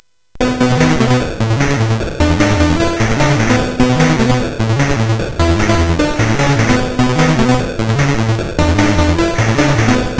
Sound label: Synth Sample III, CSG8580R5; hvsc